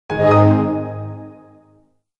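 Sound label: Windows Switch users Logon Music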